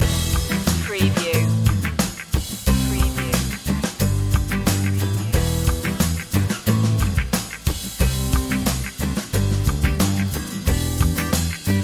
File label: happy journeys loop